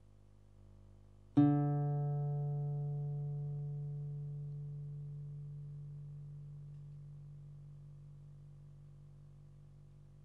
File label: guitar